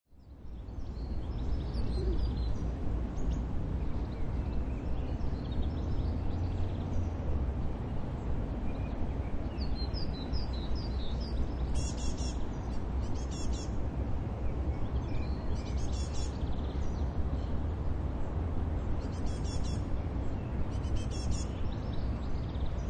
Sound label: City Park Light Ambience, Park in Oslo, Norway. Few birds singing; Few birds singing, none up close. Distant rumble of traffic, no people in the; Outdoor Sound Effects; Lynne Music